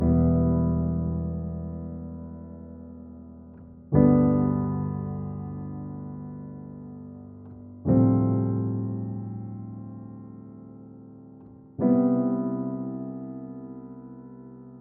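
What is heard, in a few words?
122 BPM Piano Chord Progressions Cinematic Drama C major A minor Tonic E; MMB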